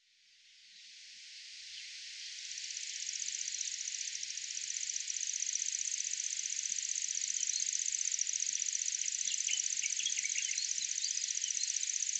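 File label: Lanceolated Warbler; sarobetsu; koe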